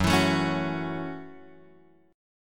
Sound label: Gb Minor 7th, Guitar Open F; strummed; JGuitar